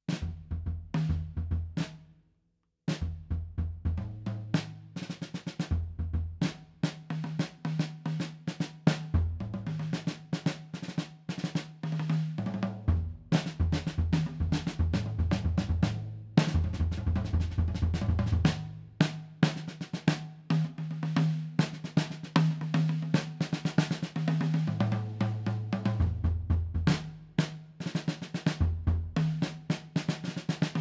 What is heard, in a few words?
Growing Tension, Solo Drums; Narrative Mind